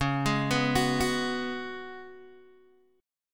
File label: C sharp 7th Suspended 4th C sharp, Guitar Open G